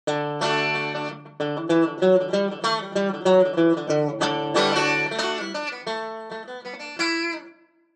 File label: banjo